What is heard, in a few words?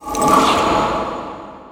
mana void cast; weapons; dota; antimage